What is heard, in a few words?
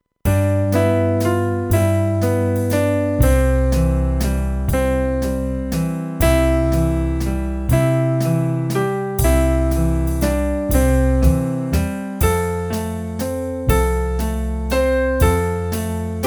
Come Sing A Song With Me Karaoke Version